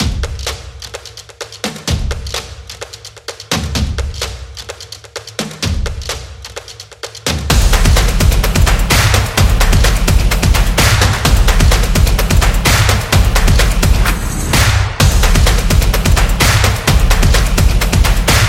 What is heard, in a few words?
Percussion Intro Beat